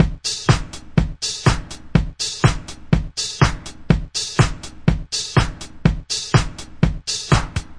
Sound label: disco punk, drum loops and breaks